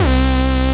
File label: Acid Sounds C, Synths; Vengeance Samples